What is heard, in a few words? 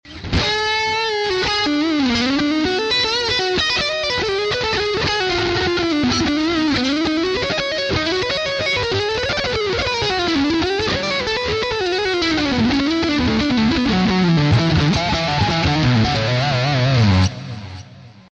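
rock soloing, guitar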